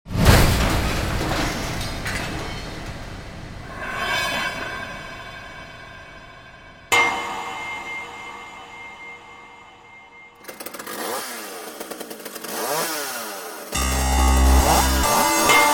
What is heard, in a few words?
hymn track